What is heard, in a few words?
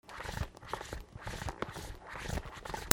FRICTION